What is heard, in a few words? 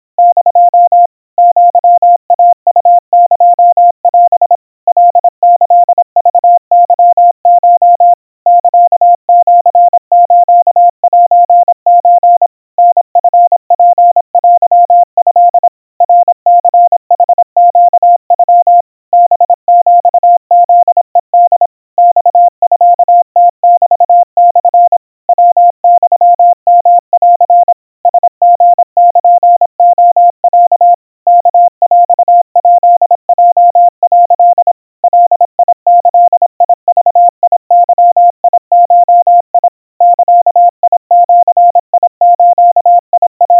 aiueo 90 cpm 700 Hz, Morse